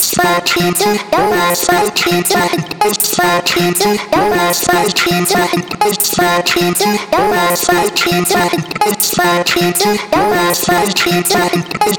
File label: Glitchy vocal chop, 160, Fm; Slate Digital Omnivox Vocal Sample Pack; Chops